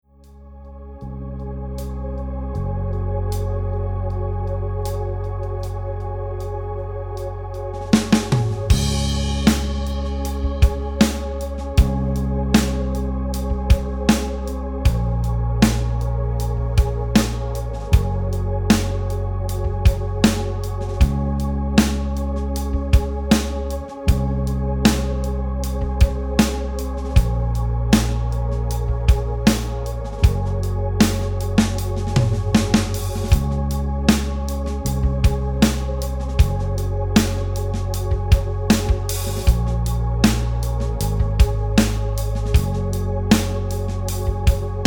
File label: rhythm